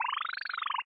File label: Minigame Power meter; papas cupcakeria online windows gamerip 2013